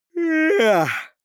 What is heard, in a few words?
yawn of adult man